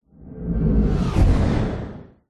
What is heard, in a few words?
sound design whoosh airy into thud; zapsplat